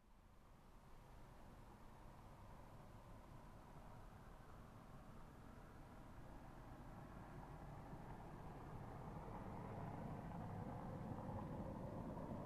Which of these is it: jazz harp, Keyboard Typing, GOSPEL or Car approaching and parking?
Car approaching and parking